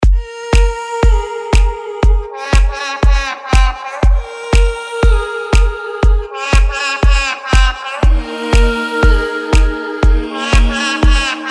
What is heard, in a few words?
Exhale Song